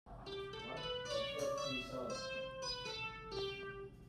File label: synth sound effect